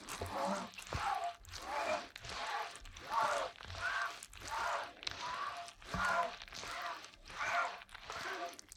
Cloth, Cloths & Sponges Foley; TheWorkRoom Audio Post

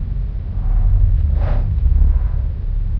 ambience; hl2; kraken

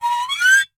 Flexatone Voice, Hidden Voices; InspectorJ

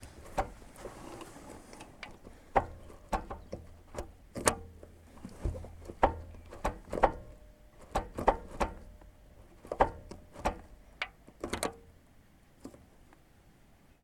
Vespa 150GS Gearshift Driver Left; DPA4061; Pole Position

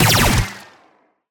Weapon Shot Blaster, SCI-FI WEAPONS; MatiasMacSD; THE WEAPONS

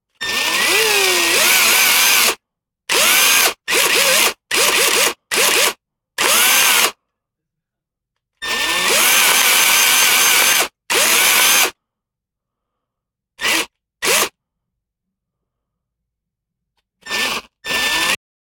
Drill Burst, Rev, Mechanical Tool, Electric; Drill - Foley & Bursts; Jake Fielding

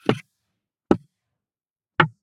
Chess, Contact, King Takes; UberDuo; Game Night Audio Props; GAMEBoard

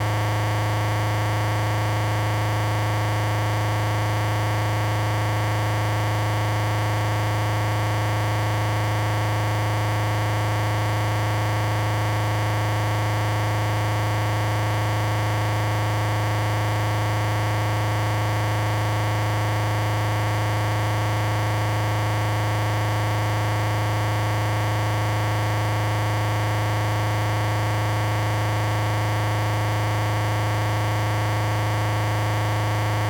Harsh Noise Feedback Loop; Glitchedtones

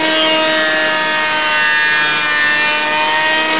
spin loop high, manhack; hl2

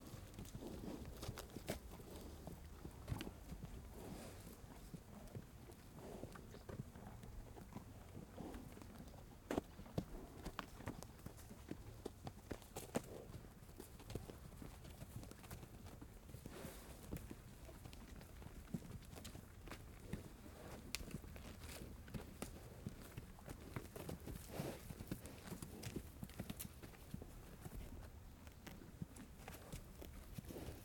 Ranch Horse Pasture Snorting Chewing Trotting; AMBFarm; Systematic Sound; General Ambience Series; Rural Countryside 01

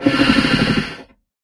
search, antlion guard; hl2

